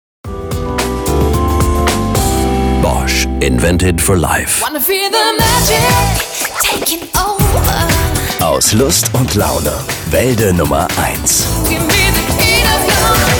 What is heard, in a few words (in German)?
Werbe Jingles